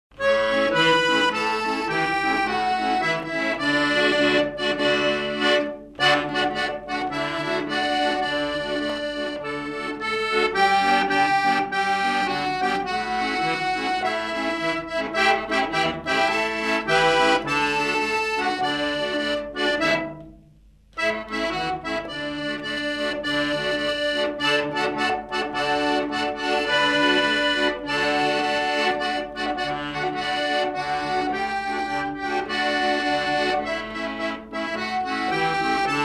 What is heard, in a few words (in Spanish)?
Pista instrumental